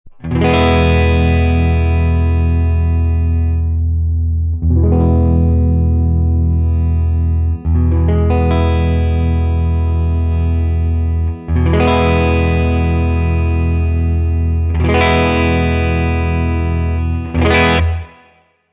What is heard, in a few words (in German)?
Clean Tone Poti Test